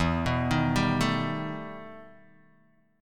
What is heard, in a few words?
E Minor Major 11th E Guitar Open D